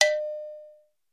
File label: Cowbell, Metallic Percussion; SN-R8-11 Metallic Percussion